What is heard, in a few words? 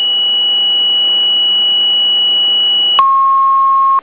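Tones, Fire Company; MASTERSONVILLE 2-12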